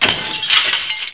Rozbitie skla; Revelation Sight and Sound SK

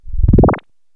Odrazy; Revelation Sight and Sound SK

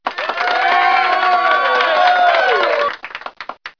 Potlesk; Revelation Sight and Sound SK

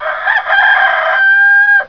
Kohut; Revelation Sight and Sound SK

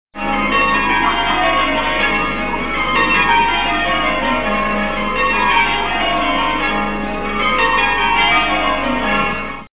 Zvony; Mesto a dvor; Revelation Sight and Sound SK

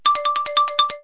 Xylofon; Revelation Sight and Sound SK

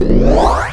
Zrychlenie; Vesmir; Revelation Sight and Sound SK

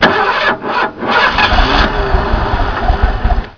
Auto startuje; Doprava; Revelation Sight and Sound SK

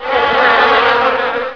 Vcela; Mesto a dvor; Revelation Sight and Sound SK

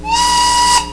Vlak; Doprava; Revelation Sight and Sound SK

